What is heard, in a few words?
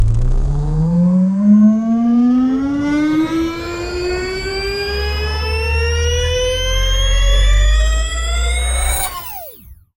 warp sound, space ship